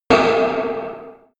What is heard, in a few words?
short loq hit; hits explosionen; metall